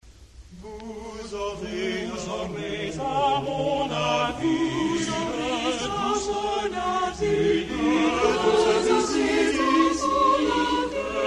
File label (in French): Le chant des oiseaux 2e couplet; Clement Janequin